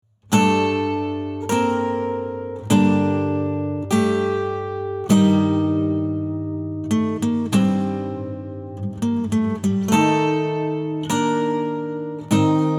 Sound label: Acoustic; Premonition